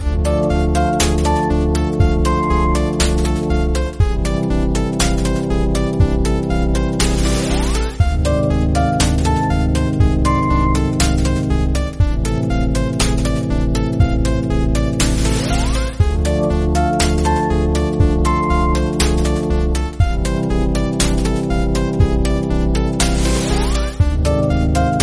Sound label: boss summon loop